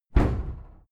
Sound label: cupboard close hard